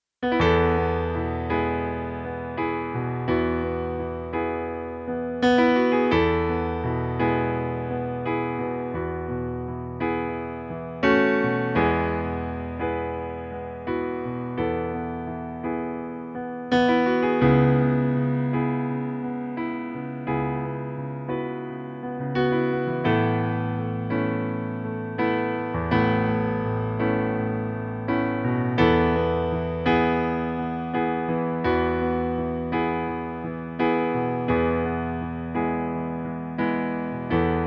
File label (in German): Pianoplayback E-Dur